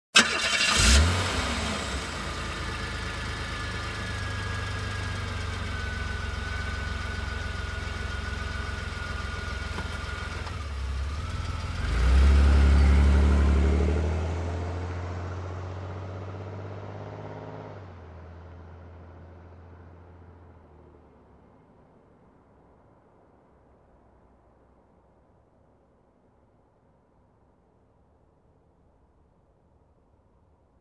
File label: Car engine start and drive away